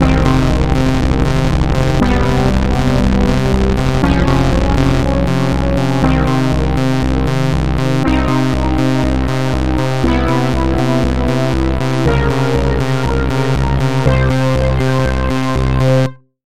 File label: Win Sequence